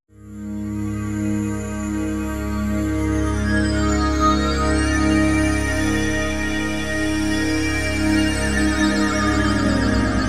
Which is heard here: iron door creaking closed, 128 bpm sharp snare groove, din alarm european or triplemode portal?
triplemode portal